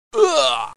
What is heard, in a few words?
male uaa